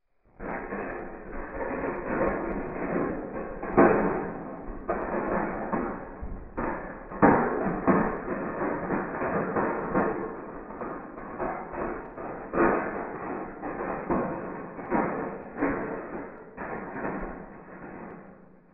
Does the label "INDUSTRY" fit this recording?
Yes